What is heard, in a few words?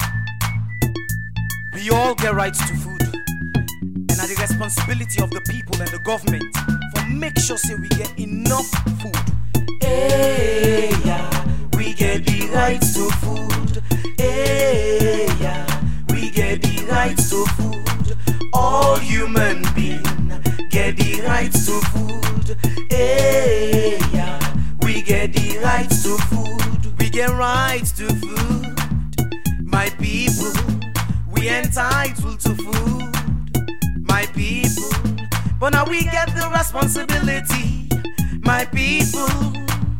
food security jingle